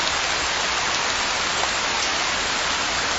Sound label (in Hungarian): Fehérzaj